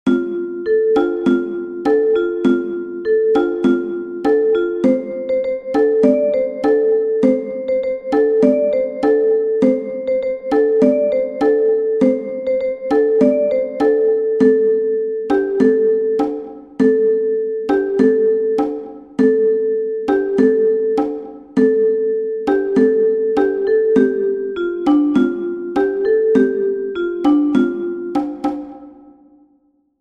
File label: Cajon